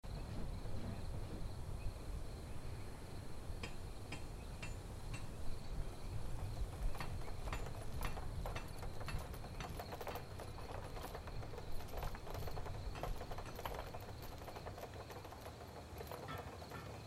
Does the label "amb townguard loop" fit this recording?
Yes